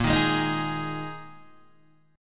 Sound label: A sharp 7th Suspended 4th down Guitar DADGAD